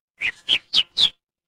animal bird

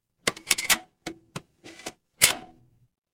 mechanical lever

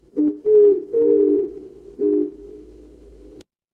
animal owl

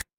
ui click

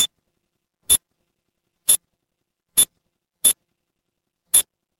ui countdown

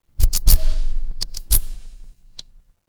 fire electric arc

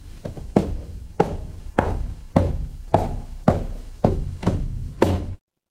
footsteps stairs

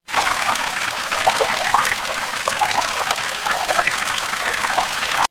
water stream

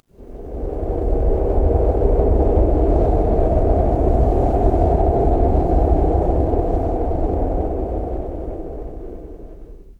ambience wind

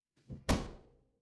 impact slam

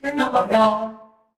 feedback level up

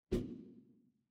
cartoon poof

